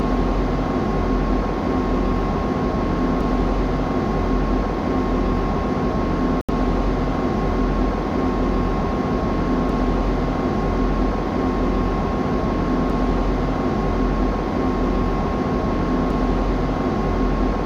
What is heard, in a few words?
ambiance concrete; noahrav